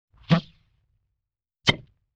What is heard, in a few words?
Sloop Poink, Vintage Cartoon Comedy Vocals